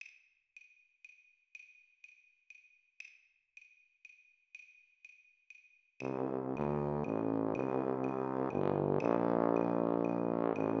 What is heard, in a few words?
Tuba Play Along 40 BPM